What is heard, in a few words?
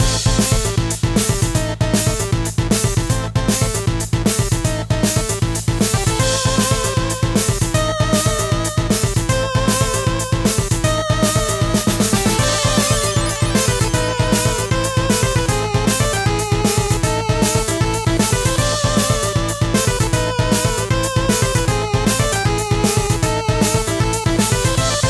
Wooden Ship Boss